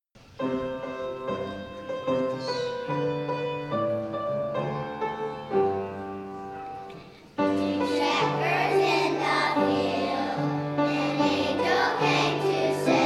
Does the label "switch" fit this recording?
No